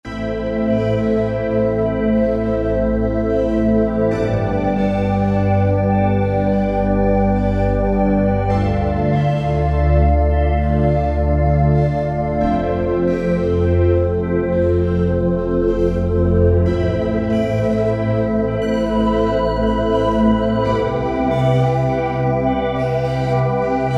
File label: Korg Wavestation Glitter Vox